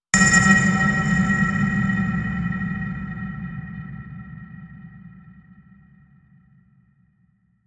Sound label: PING